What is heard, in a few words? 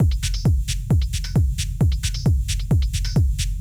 Dark Room Techno